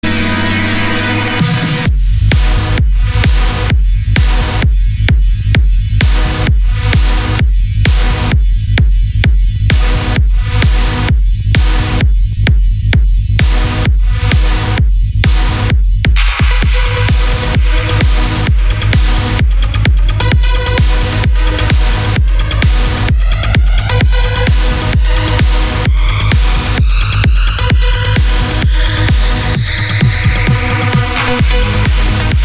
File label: trance; pulsradio